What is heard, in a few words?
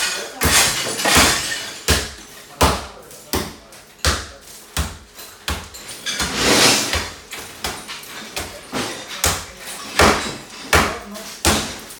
construction audio